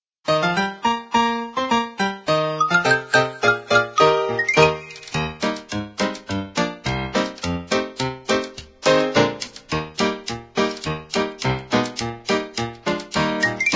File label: Keytar Duet; Oh Susanna